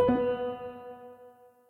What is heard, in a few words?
wood chip fail fast